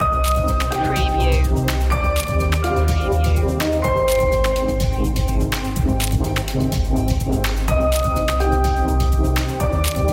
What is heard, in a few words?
erased loop